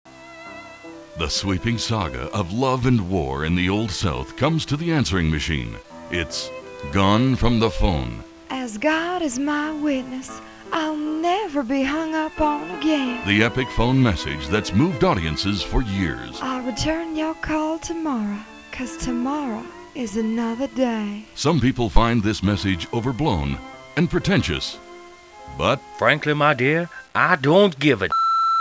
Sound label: wind